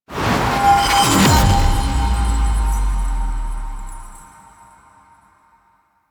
Enter; Warframe; Cephalon Weave

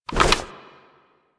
Remove From Back

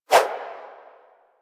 Generic Bow Load Whoosh; Warframe; Foley

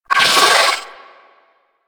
Amalgam Moa Satyr Vocal Pain; Warframe

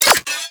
Sniper Rifle Mech; Warframe; Weapons; Corpus

